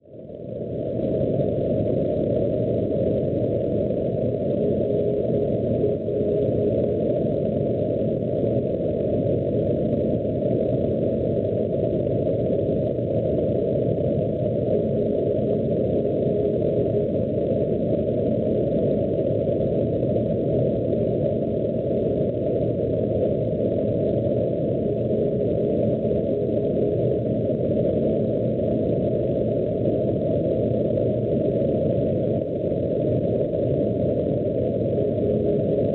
NDB, La Rochelle Francia 322 kHz; RL; Schwarzsee

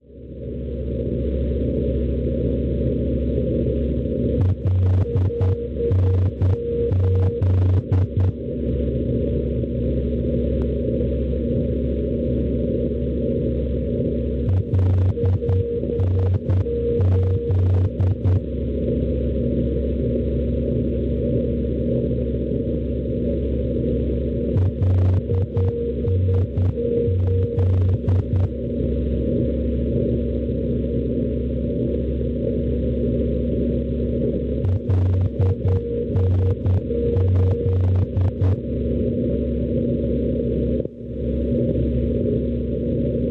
LM NDB Le Mans Francia 326 kHz; Schwarzsee

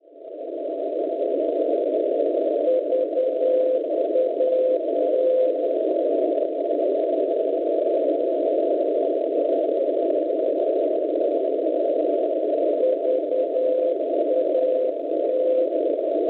NDB, Chalon Francia 367 kHz; VAT; Schwarzsee

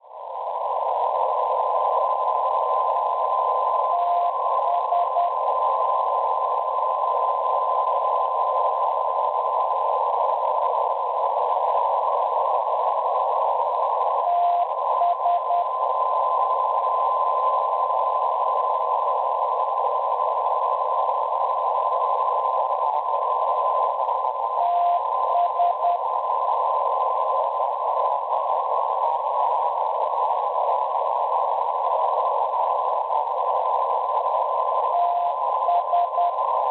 NDB, Tolosa Francia 423 kHz; TS; Schwarzsee

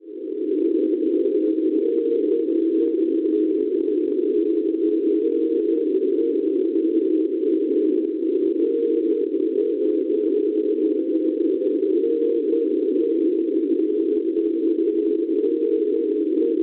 NDB, Linkoping Svezia 300 kHz; SC; Schwarzsee

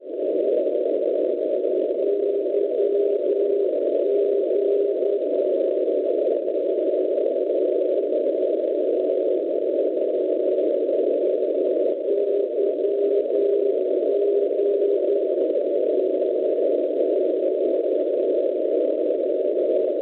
NDB, Rygge Norvegia 394 kHz; Schwarzsee

